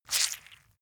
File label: leather impact mud medium squeeze